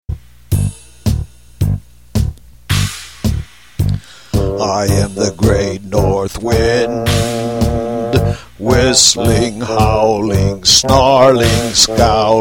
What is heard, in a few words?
wind